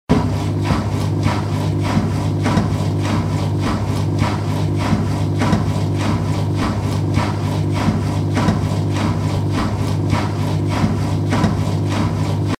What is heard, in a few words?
machine